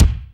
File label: Kicks Electro